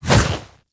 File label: Edge Fist Swipe; Warframe; Pacifist